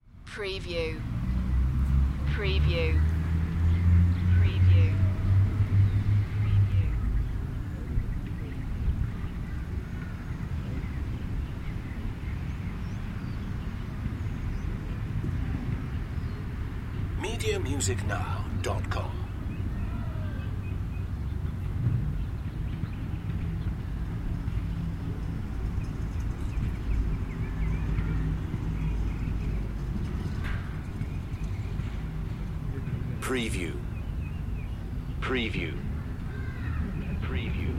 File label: City Neighborhood Daytime